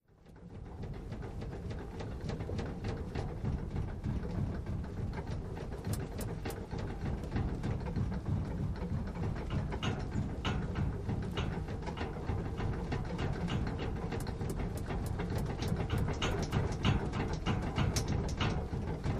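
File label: Metal Vibration Movement Dual, hits & rattles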